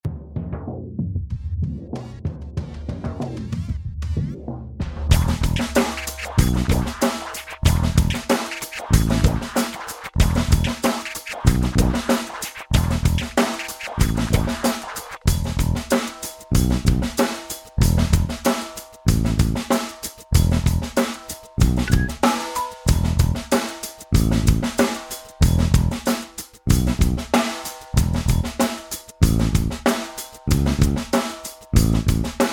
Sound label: (E) No Lead Guitar